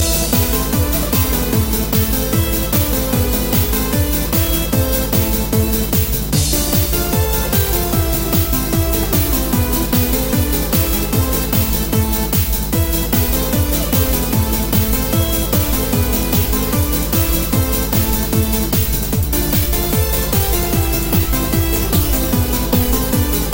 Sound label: Tetris Remix